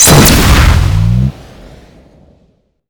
phazer beam shoot

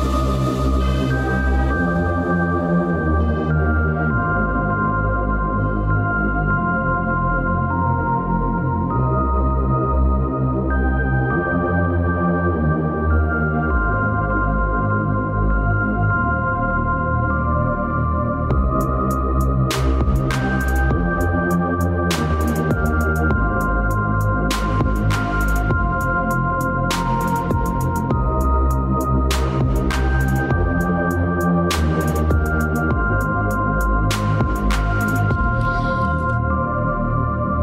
music; trespasser v2; spawnroom

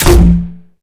proxy bomb launcher shoot

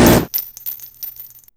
peacemaker shoot, weapons

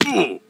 pain

